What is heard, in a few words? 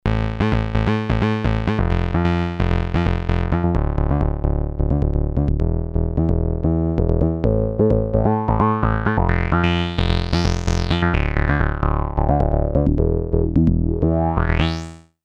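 bass, Compact analog monophonic synthesizer; bass 2; MFB nanozwerg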